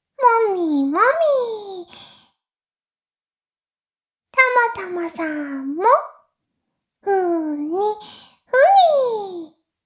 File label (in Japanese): 妹 しこしこ音声; おにいちゃんアイスぅー！